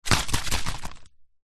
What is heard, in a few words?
cola bottle plastic shake little cola short fast; food drink